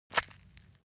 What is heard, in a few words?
leather impact mud soft squelch